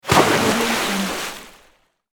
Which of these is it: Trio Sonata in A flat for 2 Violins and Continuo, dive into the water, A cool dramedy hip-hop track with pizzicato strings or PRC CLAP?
dive into the water